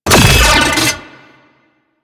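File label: Drone Orbital Strike Projectile Fire; Warframe; Corpus; Corpus Lich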